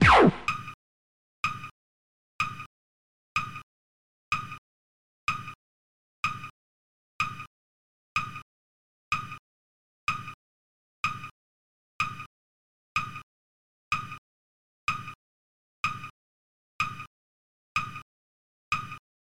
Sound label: Countdown; Now Pop Quiz